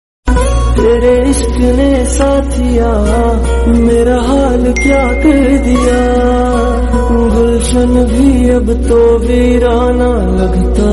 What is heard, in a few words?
sad flute hindi